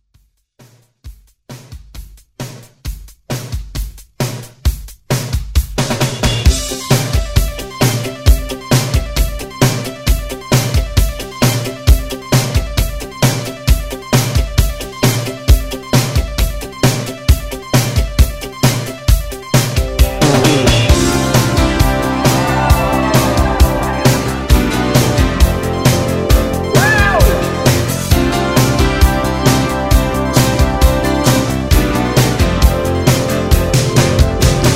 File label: 80s Pop Redrum